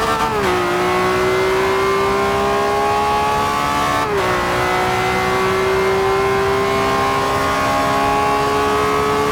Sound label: third, vehicles; aventador